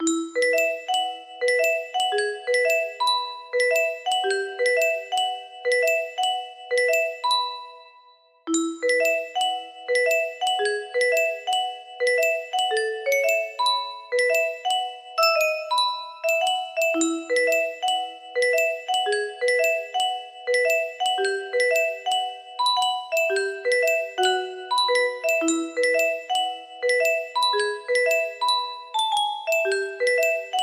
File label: 5 semitones down, melody; undertale